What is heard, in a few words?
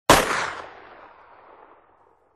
rifle